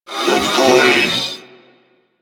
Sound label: Purgatory Warrior Charge Scream, Barks; Warframe